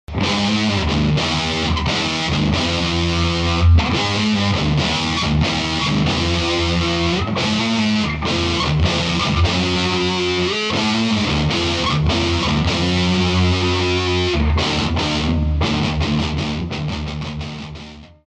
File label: badcat double gain